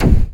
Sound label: Brown noise perc